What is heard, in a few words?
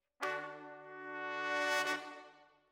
Brass